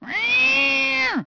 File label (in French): chat nerveux